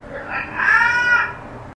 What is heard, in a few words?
laugh man